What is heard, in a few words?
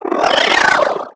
BokoBaba Appear